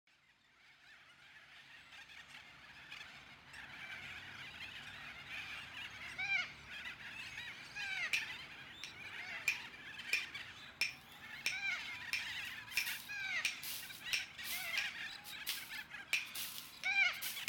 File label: Cockatoo soundscape